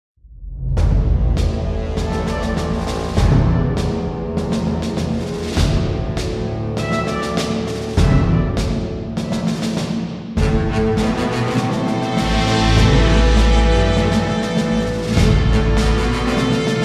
Game Start

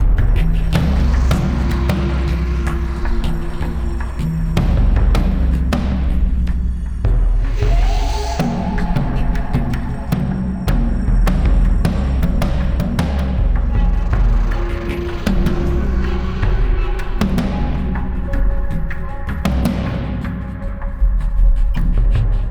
Combat Fight Medium; Eden Rising

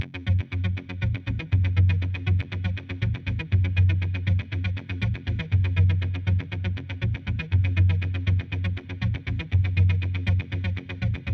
Main Menu Background Music Tech; gamerip; Bank Limit: Advanced Battle Racing

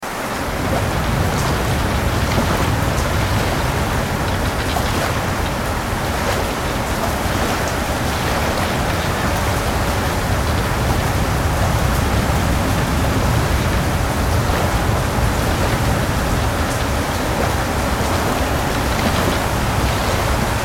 Outside Ship; a dump in the dark

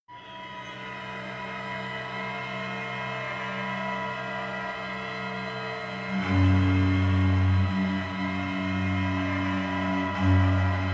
Night Amb Stinger, gamerip; dying light

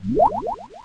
Water Pulse; pokemon reborn e19 2022

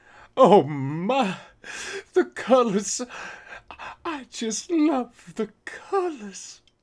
Male Reaction

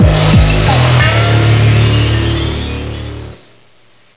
Ladder; ultimate mortal kombat nds 2007

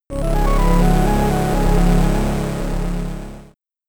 Boss Appears, amiga

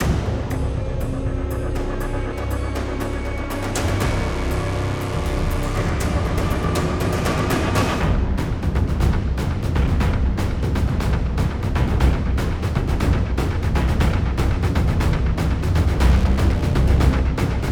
Practice Throwing Grenades - Loop

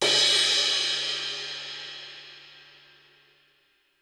Crash

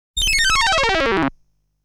Player falling sound; legend of hero tonma

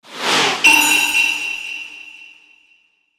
Wafu Card; Yu-Gi-Oh! Master Duel

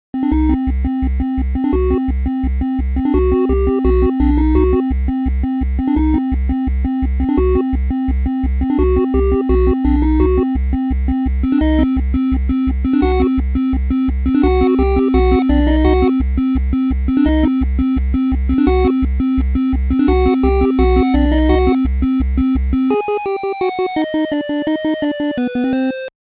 8-bit; Lenna's Inception; The Chairman

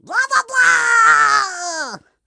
Cat Fly; Rabbids Big Bang; android

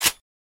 Shotgun Pump release; criminality

